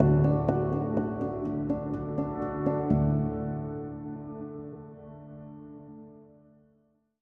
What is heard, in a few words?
Bittersweet Contemplation, Bumper